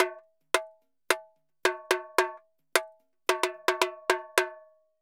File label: Tamborin Murga 110 bpm; Best Service; Festa Latina VOL-1